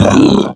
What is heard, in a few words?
sleep hit